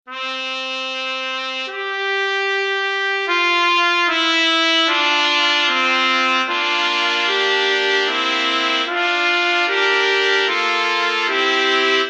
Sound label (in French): Trio de trompettes